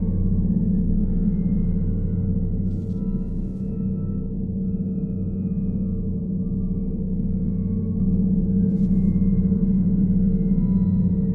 amb gravity storm; Stellaris; cosmic storms

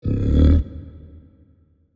kaiju adult pre chomp; biogenesis

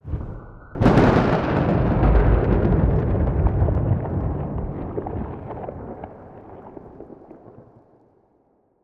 sfx amb vfx thunderclap; ambient; System VFX

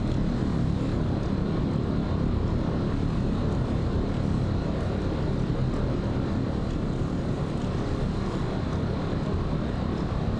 ship destroyer idle; Stellaris; machineage; ships

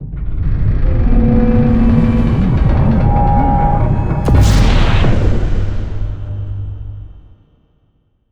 sfx scavenger bot death; Stellaris; distant stars

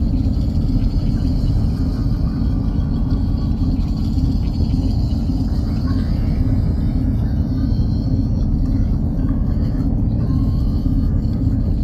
defense station idle hum; nemesis; Stellaris

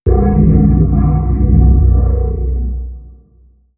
shroud eaten planet; ambient; Stellaris

